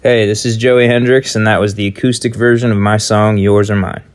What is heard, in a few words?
Outro Acoustic